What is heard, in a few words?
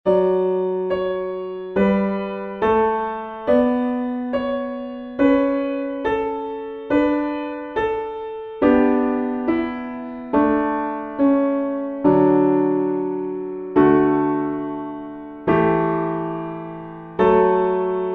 piano backing, hymns; recorder; praise my soul the king of heaven